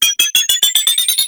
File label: Suicide Scuttler Pre Death Beep; Enemies; Gameplay; Boss; Warframe; Oraxia